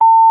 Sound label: bong tone; rm-324